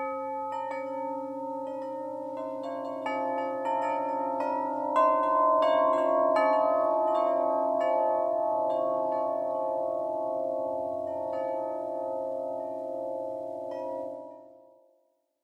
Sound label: Big Ben 82in, wind chimes; Ultimate series